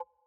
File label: checkpoint neutral